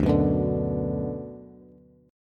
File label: D 6th D down Bass, chord; D6 strummed; JGuitar